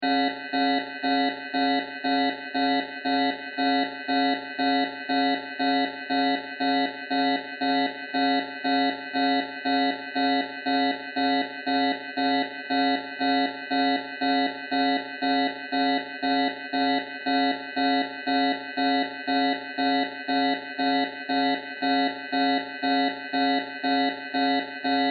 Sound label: Science Fiction Space Station Alarm Loop; Spacecraft Sound Effects